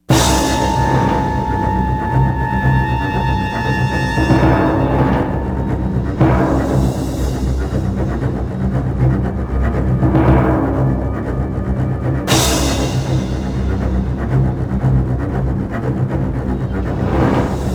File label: fast, music; Soldier Of Fortune II Double Helix; XBOX; kamchatka